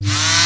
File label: saw start fast; weapons; Sourceforts2.0